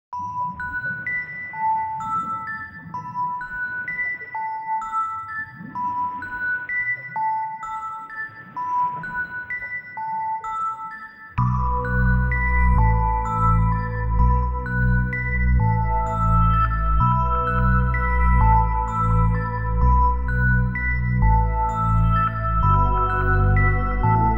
カラオケ用BGMのみ; おまけ歌唱フルコーラス; 歌無しカラオケ用

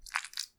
浅めにストローク, ピストン運動 激しめ

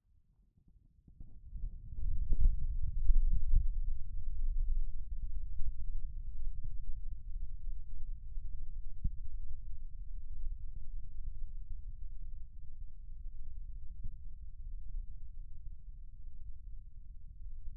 強く耳塞ぎ L; KU100

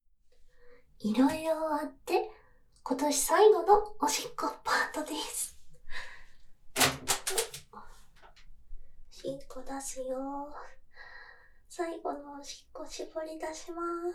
おしっこ音声

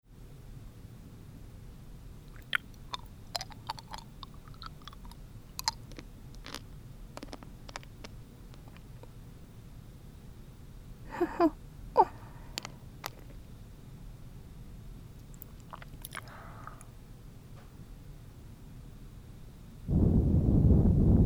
耳掃除 左耳掃除、アイマッサージ＆ツボ押し, ASMR; 耳掃除

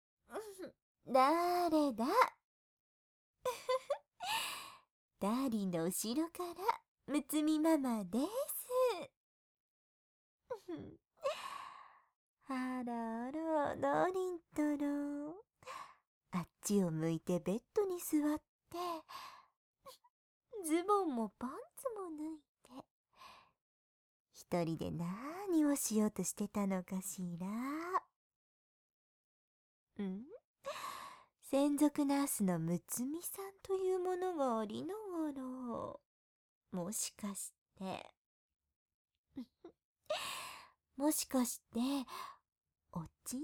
耳舐めのみ; 六海さん生足コキ耳舐め

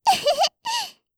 えへへ, 笑い声

